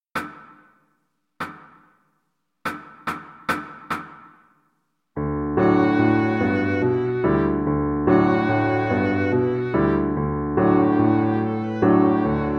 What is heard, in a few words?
Viola Duet